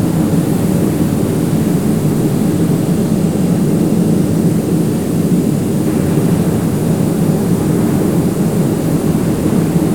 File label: elevator amb loop ventilation; Ambient